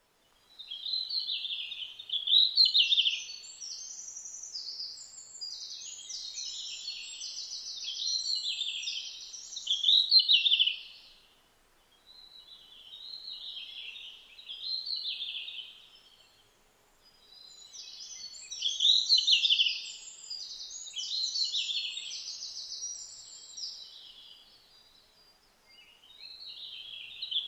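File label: ruribitaki, red-flanked bluetail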